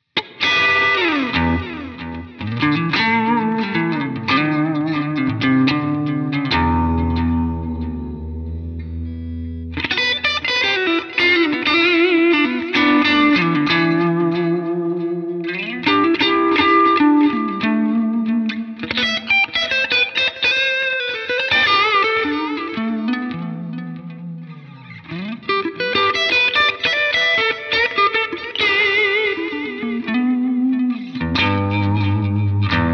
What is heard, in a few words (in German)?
Gitarren Plug ins im Vergleich Bias FX 2 Breakup